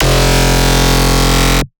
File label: Bass Shot F, Dubstep; One-Shots; Printer; Ghosthack Advent Calendar 2021 - Day 16 - Dubstep Basses